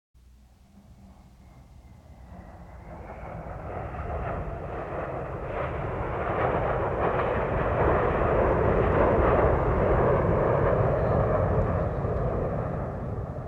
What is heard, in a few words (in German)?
Luftwaffe B 52 startet Hound Dog Missile